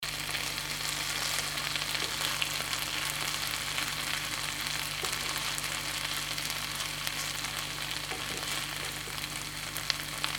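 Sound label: sound of frying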